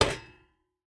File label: footstep boots metal jump land; Rust